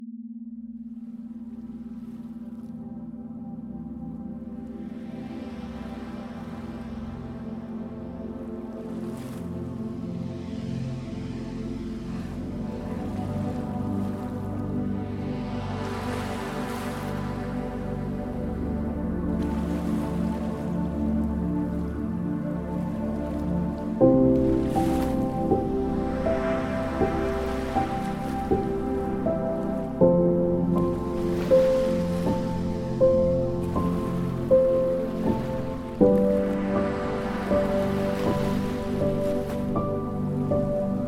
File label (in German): Beta BB 18Hz mit Ambient Sound; Mentaltraining; binaural